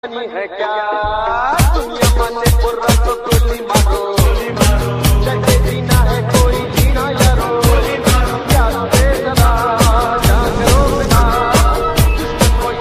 remix ringtone

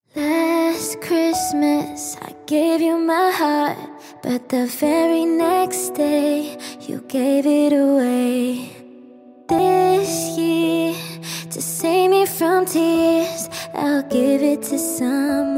Acoustic

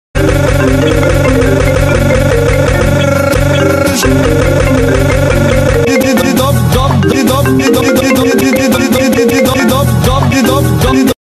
Apple iPhone ringtones; Skibidi

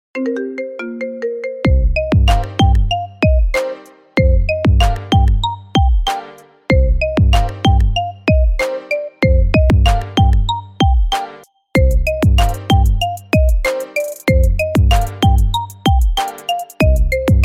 Marimba Remix Marimba Ringtone

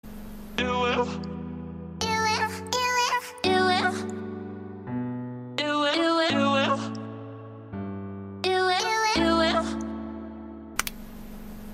UwU but in popular games Minecraft

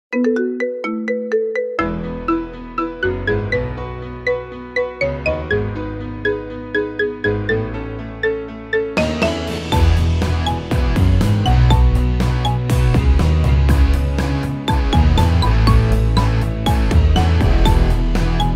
Marimba Remix

